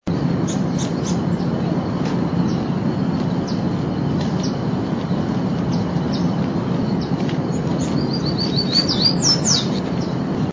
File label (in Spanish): voz arañero coronado chico